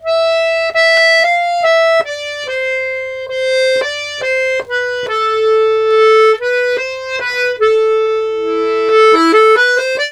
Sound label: Complete Accordions; USB Soundscan vol.40; AKAI